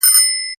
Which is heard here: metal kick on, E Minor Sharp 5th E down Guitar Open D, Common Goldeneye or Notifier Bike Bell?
Notifier Bike Bell